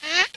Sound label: Zing; Princess and the Pea; SoftKey